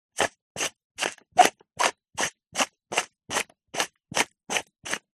household pepper mill wooden large turn grind multiple